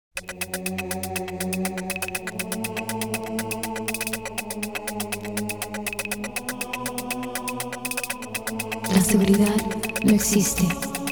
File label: Chants